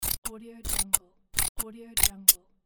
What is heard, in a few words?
scissors cutting